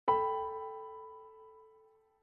Piano

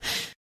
Breath

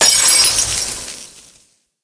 Vial Break; angry birds

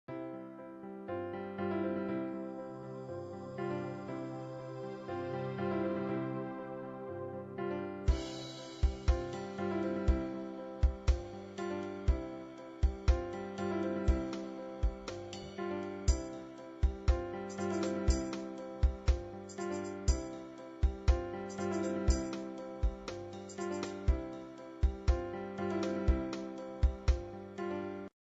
Romantic; Webkinz

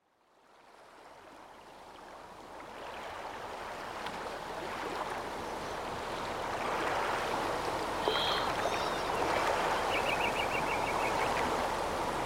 tudor ambience; Worms 3D

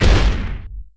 explosion small

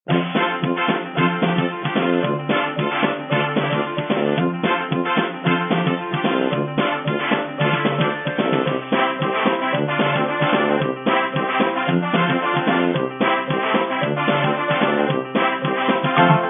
Radio Music; crazy machines 2 gamerip

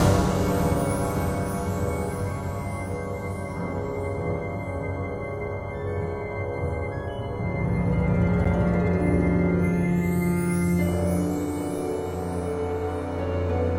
Eerie

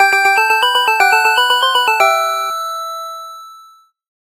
Game over SE; Kemono Friends Cellien May Cry; macos; windows; ParkmanGameoverSE

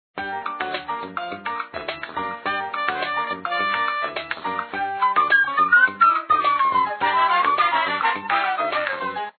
Shop music; the black knight get medieval online 2005

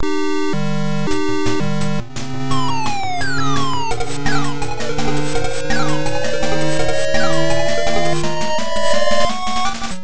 Start ~ Surface BGM; Magmax; Arcade

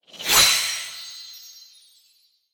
Logo Boomerang; Boomerang Fu

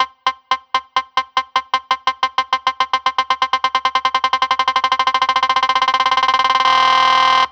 Attack Clock Almost Up

